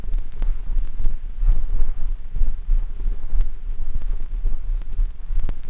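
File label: vinyl, click crackle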